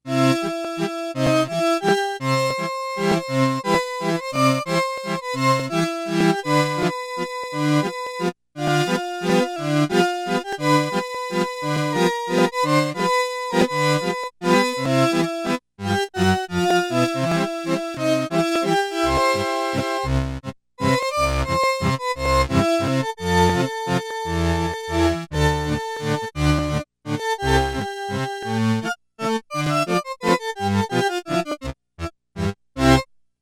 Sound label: Accordion